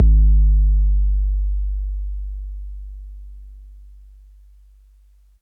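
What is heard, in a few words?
bass drum 808 MPC60 Noise G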